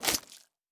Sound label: Stab, Medieval Combat Sounds; Heroes of Hiis; Fantasy Sounds Bundle